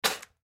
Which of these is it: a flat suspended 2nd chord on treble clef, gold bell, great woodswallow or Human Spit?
Human Spit